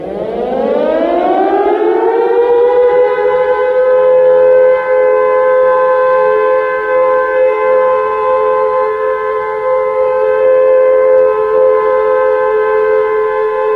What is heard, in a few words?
AIR RAID SIREN SOUND